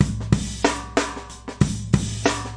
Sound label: sprase nocompressor, drum loops and breaks, 93 bpm; 091 to 100 bpm